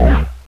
EGG BONG

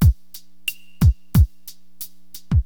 Drums loop, HipHop; LiveAct Pool 1

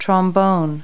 Trombone; Multi-Lingual Talking Picture Dictionary

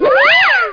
Enemies Sound; ewj

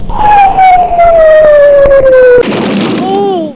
falling

